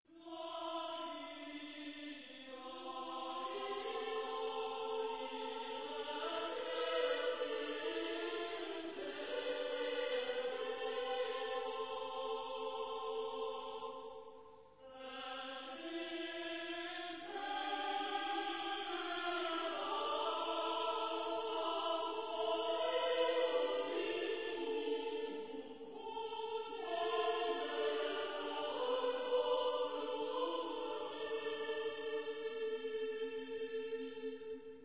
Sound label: choir & orchestr